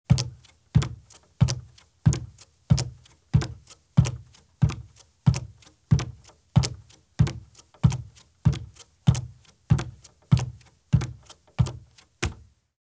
Bare Feet Walk at Fast Pace on Wood Porch or Footbridge; Sound Ideas; Footstep Sound Effects